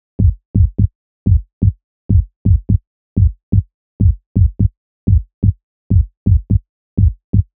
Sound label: 126 Em Bass; Places